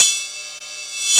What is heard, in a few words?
RIDE, DRUMS; Analogon